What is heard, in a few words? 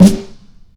round snare drum f sharp key